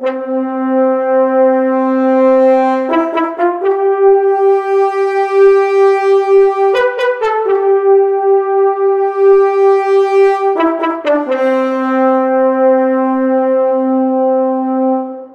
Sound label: Happy Horns, трубы